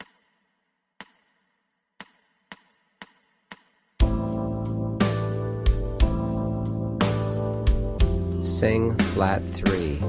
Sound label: Slash Chords Funk Alto; slash chord ear training